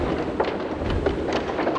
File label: wind; fairy